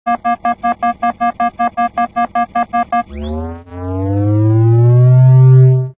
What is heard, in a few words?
Low health and shield recharge ringtone; Halo CE